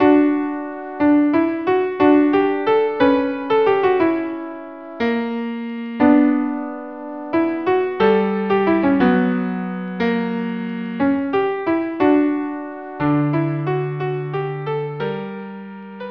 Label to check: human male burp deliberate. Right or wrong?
Wrong